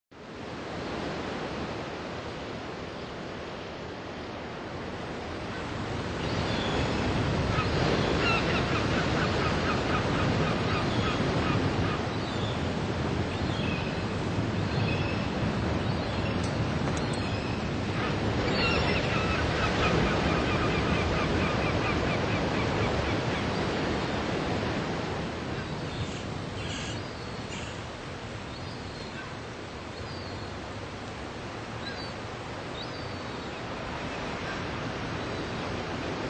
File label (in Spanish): Sonido del Mar y sus Gaviotas; Sound Effects